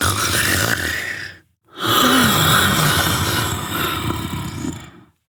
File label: Snores, Mother, Vocalizations; Warframe; Deimos